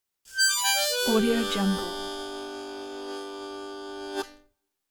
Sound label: decending harmonica chord accent